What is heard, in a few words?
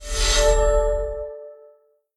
levelup